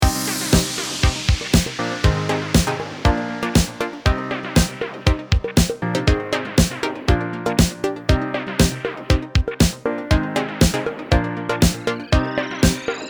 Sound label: Funk